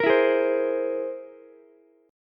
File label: C Augmented 7th, Ukulele; strummed; chord; 15,16,16,15; JGuitar